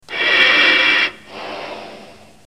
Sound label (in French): Respire